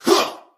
huh group of men; ooh ahh; heh huh

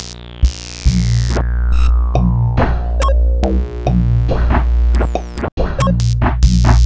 hard style, Electronic Loops

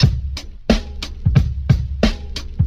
Drum loops Boombap

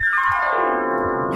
piano flourish

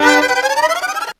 shivery violin, Stabs

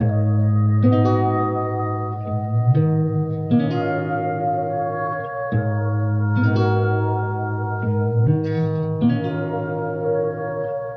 flute and guitar, Sad

